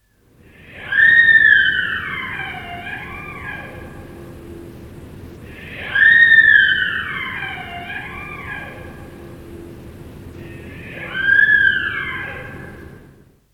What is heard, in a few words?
Sika Deer Stag